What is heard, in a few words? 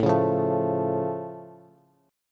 A Augmented A, Bass; chord